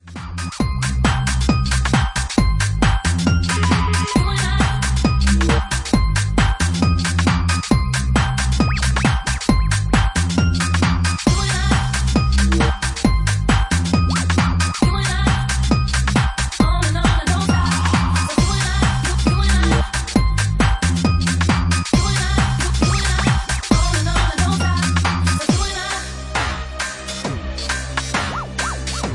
Bassline Mix

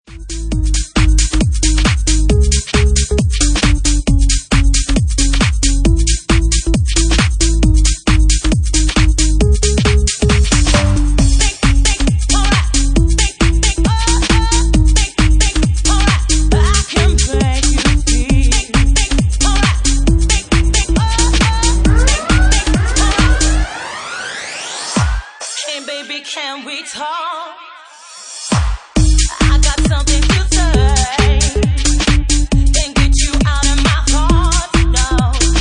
Organ Mix

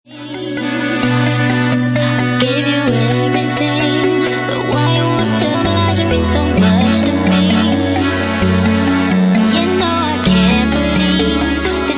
UK Funky

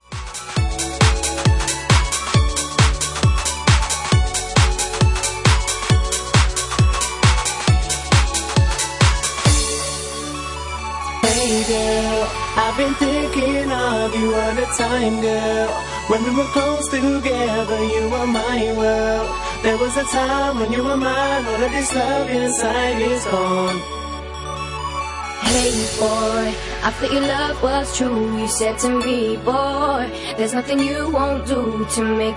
Bassline Mix

